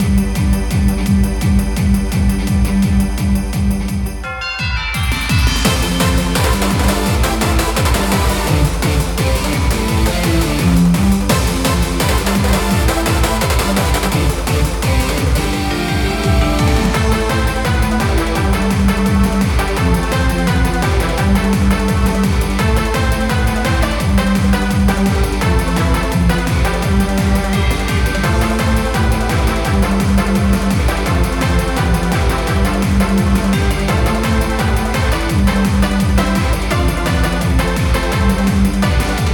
super santa claus bpm170

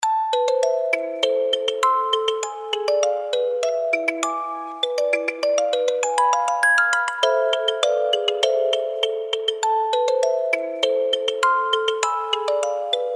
Music Box Intro

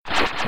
ability vampiric aura appear

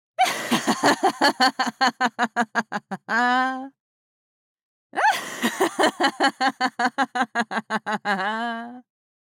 evil cackle laugh

